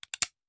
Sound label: Hand Gun Foley; Sound Ideas; Guns